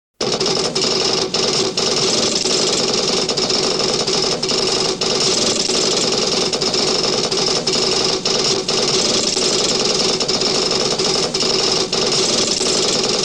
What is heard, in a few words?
Library Computer On Bridge; Star Trek Original T. V. Series Sound Effects